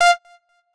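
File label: Beep Lead High F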